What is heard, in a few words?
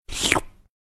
Slurp